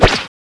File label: katana slash, weapons; katanad slash2